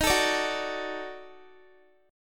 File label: D sharp Minor Major 7th Flat 5th, Guitar; strummed